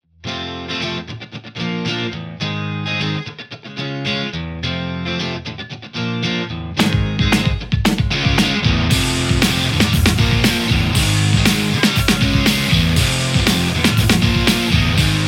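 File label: Stratocaster JCM800 hot; mercuriall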